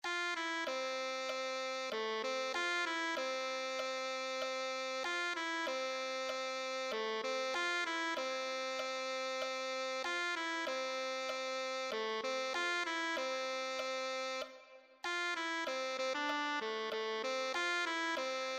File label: tenor saxophone